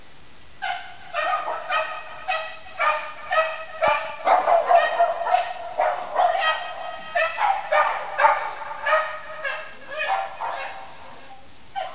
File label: dogs